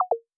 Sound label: piece Cant Place